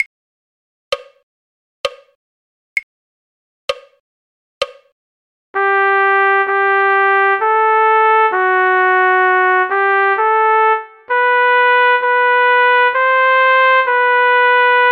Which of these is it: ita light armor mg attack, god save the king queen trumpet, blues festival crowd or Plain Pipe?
god save the king queen trumpet